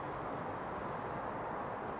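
Redwing, Digital audio recorder and EM172 lapel mic; nocmig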